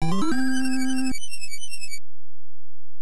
Game Start; kart fighter; dendy

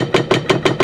Drawbridge Gear Loop

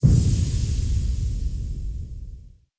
spell pickup final boom

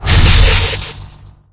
Vehicles Generic

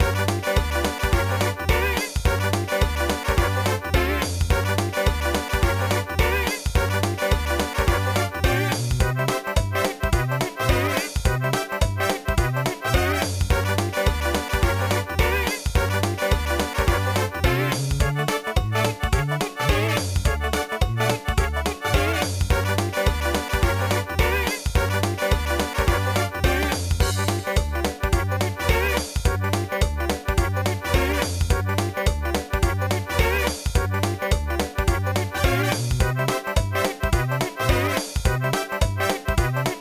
Swamp; Whac-A-Mole; GB; GBA

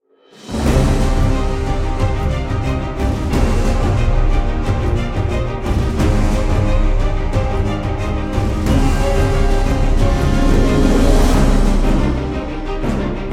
BGM Battle; aster tatariqus android ios mobile gamerip 2023

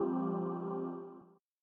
Play Countdown; Aenigma OS; Wii U

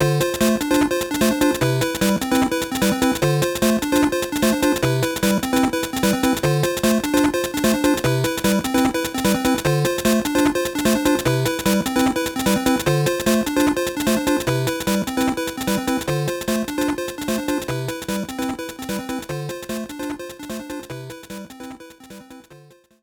Bonus Screen - Invincible BGM; GBC

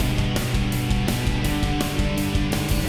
Boss Fight Phase 2 MIXED

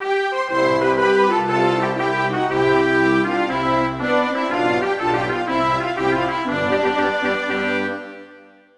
Anthem Chile; Buzz! The Mega Quiz; PS2

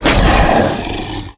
damage reaction

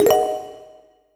goal complete; q-bert rebooted gamerip 2014

